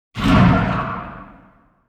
gun tails; soundmorph

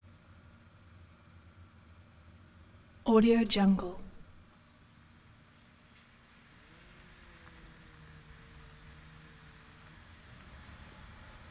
2000 ford focus car ext; prosoundfx

